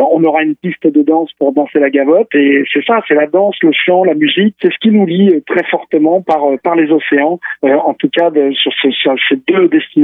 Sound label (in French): soiree celtique danse chant